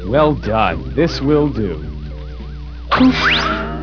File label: poof